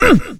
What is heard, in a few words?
Mmh; Nicktoons Nitro; arcade